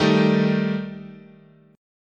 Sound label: D sharp Major Flat 5th, chord